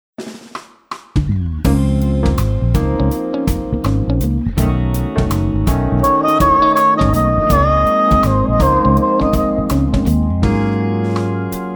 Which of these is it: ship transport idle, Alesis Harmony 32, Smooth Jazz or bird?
Smooth Jazz